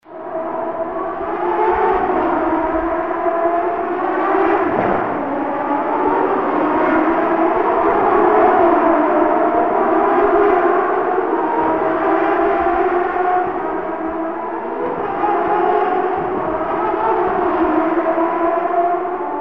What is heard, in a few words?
Sound effect racetrack